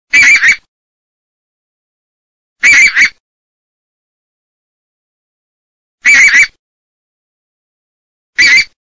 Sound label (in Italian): OCA GRANAIOLA